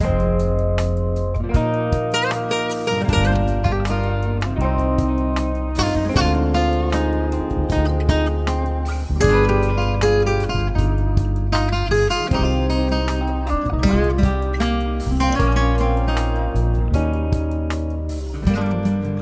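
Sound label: Relaxing guitar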